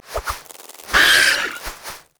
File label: dragon; lulu dragontrainer